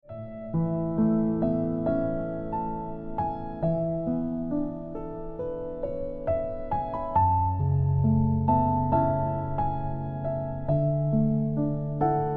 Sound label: Ringtone Piano Music